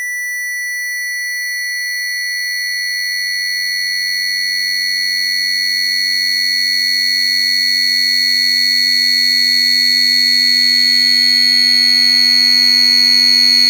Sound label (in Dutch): Verbinding, FM; Polyend